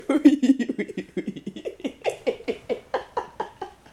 Rire

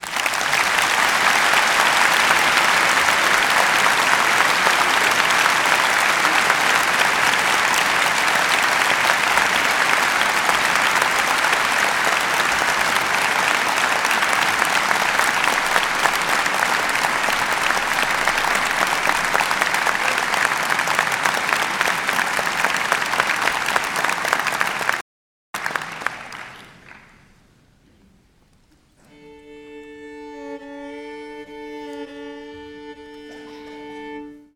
Applo Accord Large

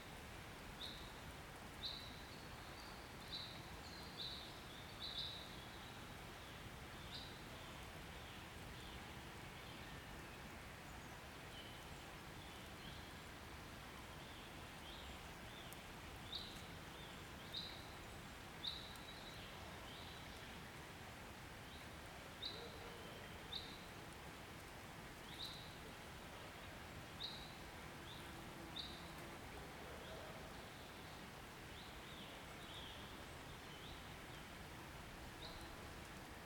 Foret Birds Wind Stream

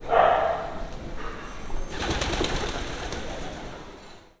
Chien Pigeon

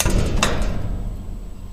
Porte

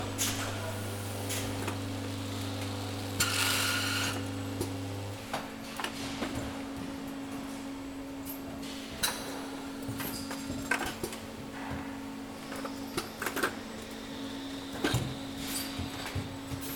Usine Machines Chirurgicales

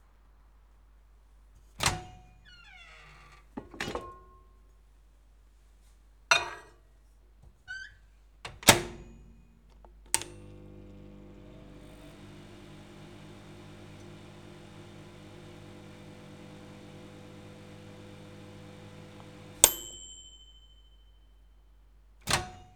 Microondes

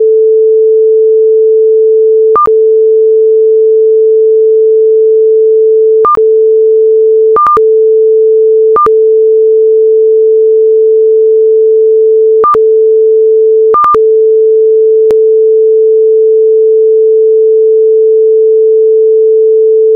Electrocardiogramme

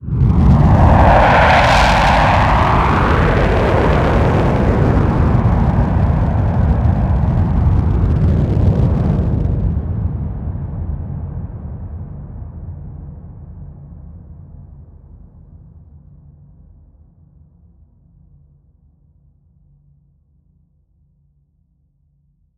Avion

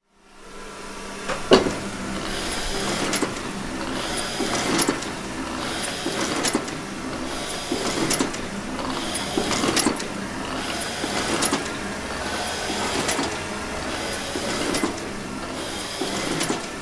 Machine impression sur cylindre rotatif; Cedric Peyronnet

